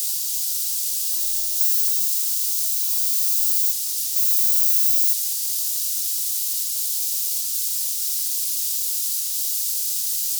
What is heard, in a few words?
31150khz gfsk 213333bd